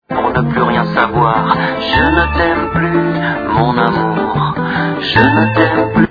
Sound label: bongobong